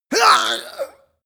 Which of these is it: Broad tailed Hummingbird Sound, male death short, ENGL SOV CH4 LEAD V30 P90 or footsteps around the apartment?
male death short